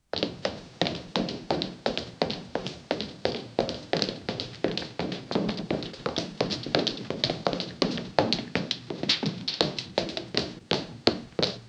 sounds of foot steps runn, hard floor

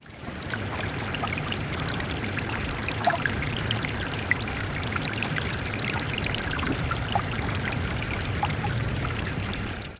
a serene clear river flow

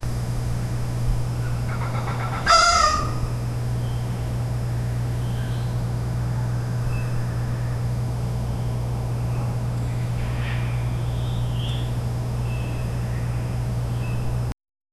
early morning ambience

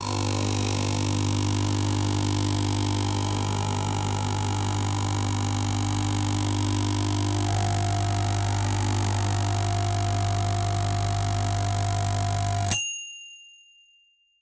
create a stylish upbeat b, commercial

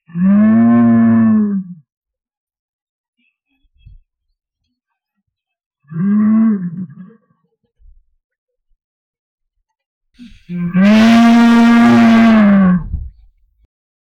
a cow being abducted by, abduction

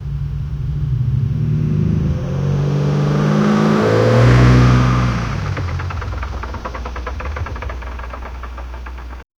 a car drifting on wooden

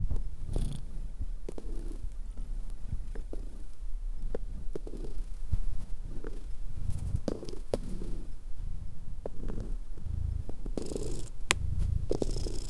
cat is walking footstep s

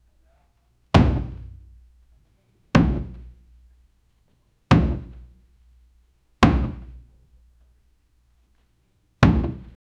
loud heavy 5-6 hammers st, wood

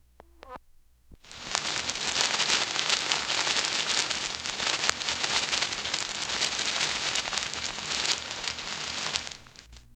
close up asmr thousands o, textures

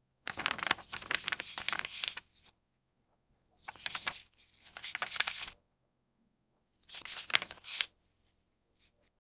cutting chart paper in st

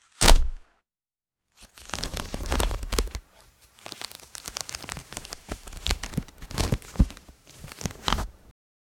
grimoire open heavy ancien, book

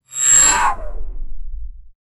the sound of a futuristic, activation

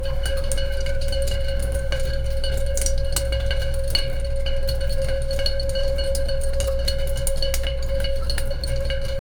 immersive asmr soundscape, laboratory

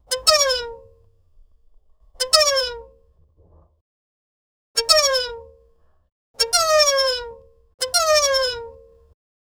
slow squeaky toy sound

